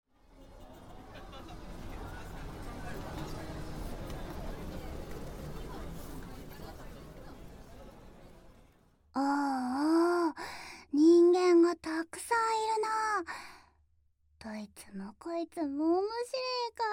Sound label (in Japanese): 野外での悪質な耳舐め地獄, ASMR